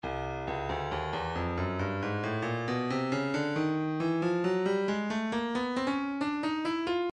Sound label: breakbeat midi files 065 069 bpm; Stylus